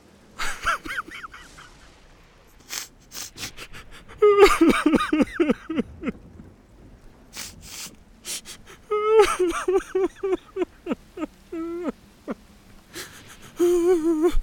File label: weeping